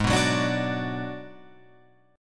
G sharp Minor 7th Flat 5th Guitar Open F, G#m7b5 chord; strummed; 8,6,6,9,9,9; JGuitar